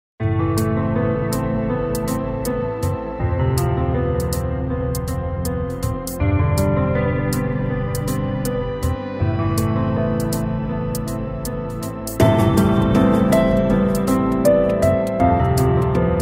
Instrumental Piano

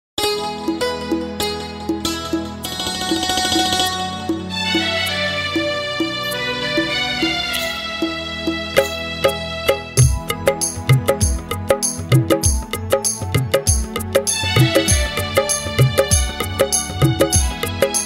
Karaoke Music

